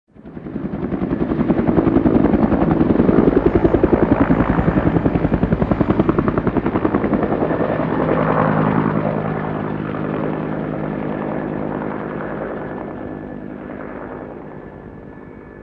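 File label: helicopter flyover stereo; amb elements; modern warfare 2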